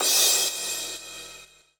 Crash; Equipped Music; Loungin House

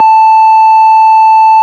ORG Farfisa

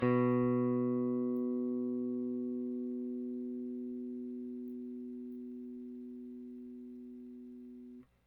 Rickenbacker Bass; Airwindows

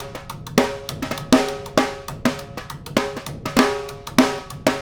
Snare Pattern, Drums; Essential Percussion

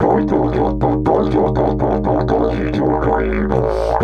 120, Didgeridoo

